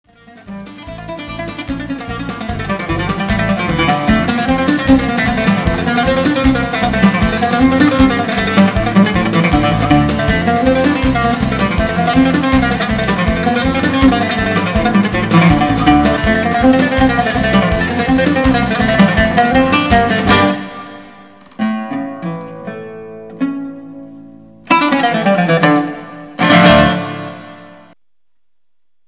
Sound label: tecnical, oud